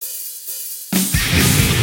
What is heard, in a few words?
reel Spingle Start